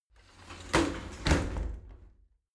shower slide door close regular

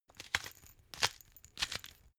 glass pepper grind sequence; berufe; gastronomie; soundpool

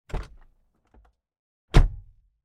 mercedes door inside

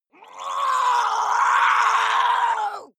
water monster female gargle scream angry; koerpergeraeusche; comedy-cartoon; soundpool

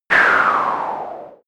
noisy whoosh; swooshes-swishes

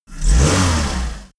car revving

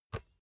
basketball catch gentle

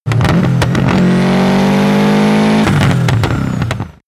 car subaru impreza acceleration fast short

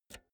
pull out jack multi socket quick

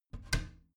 bathroom cabinet warped close soft

